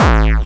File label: BD Distortion, Bassdrums; Vengeance Samples